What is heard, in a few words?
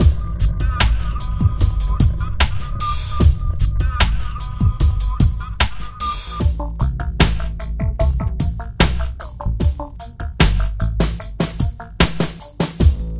Trip Hop Reggae